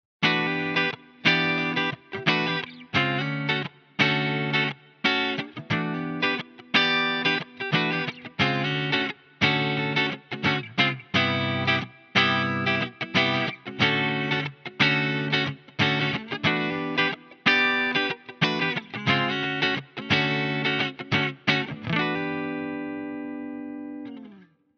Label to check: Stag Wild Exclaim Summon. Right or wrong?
Wrong